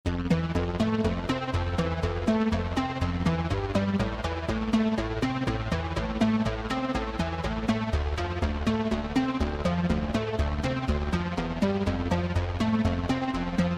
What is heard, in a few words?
Arp